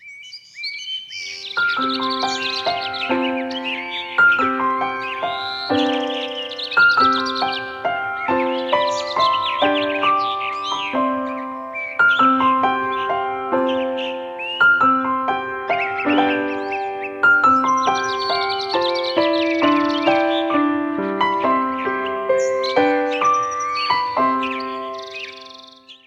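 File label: Nature, ringtones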